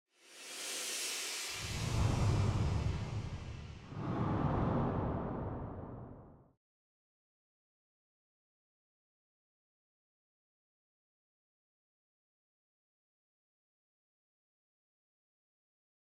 SFX; Bad Dad Rehab; Bad Dad Rehab trailer

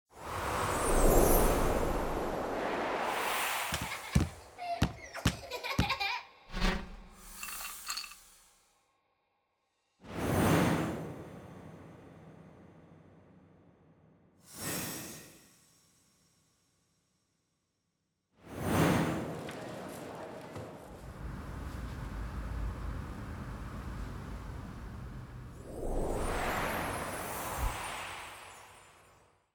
SFX; A Christmas Blessing launch revised

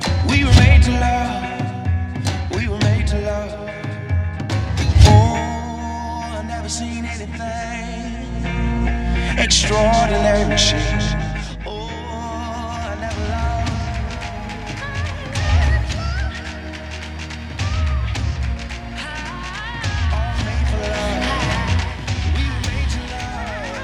Music Split; Chevy

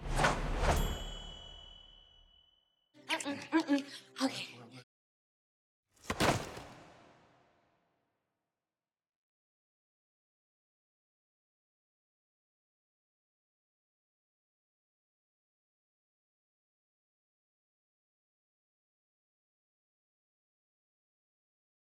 SFX; BornAgainVirgin; Script to Screen

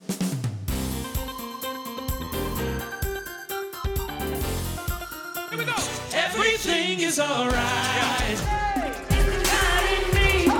Gospel, Music; Allstate Gospel Superfest

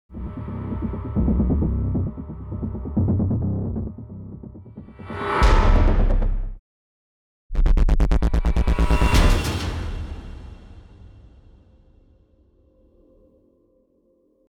Music; Fatal Attraction Generic

